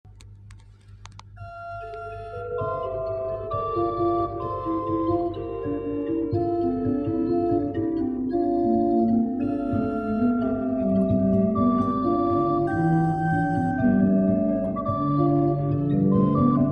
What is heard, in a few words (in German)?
Becker 3 I P, pfeifenorgeln